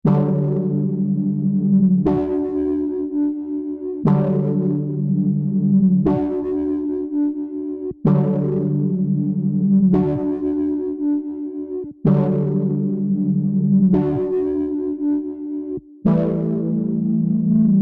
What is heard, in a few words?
Retro Synth Chords